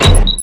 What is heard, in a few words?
grenades ammo; serioussam2